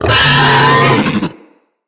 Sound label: Infested Juggernaut Vocal Attack; Vocals